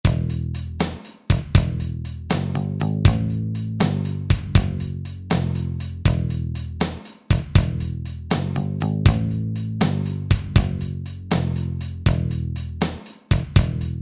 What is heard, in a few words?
Blues